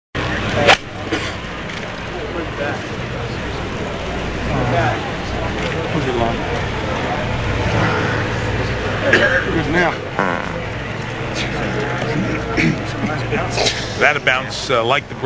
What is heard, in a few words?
fart brake